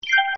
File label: signal